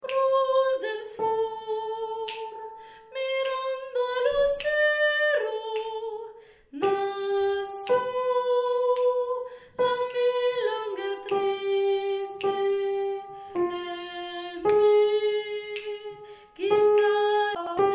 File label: mezzo